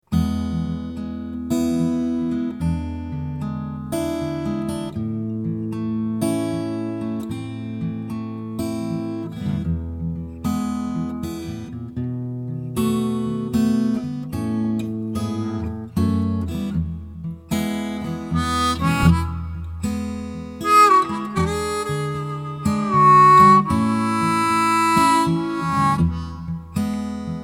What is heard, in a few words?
tremolo; SU-21W; amazinggrace